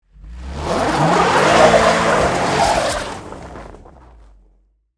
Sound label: Tires Spin Snow, Transportation, Automobile, Tires, Spin, Snow; Saturn, Vue; Car; BlastWave